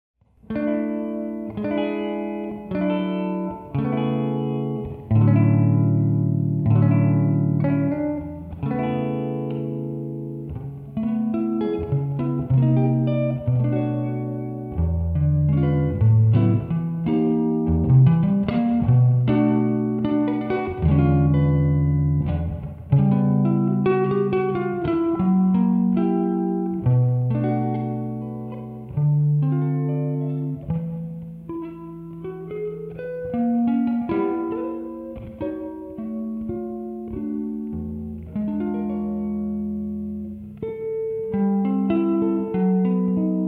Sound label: improvisation, solo guitar; Ted Greene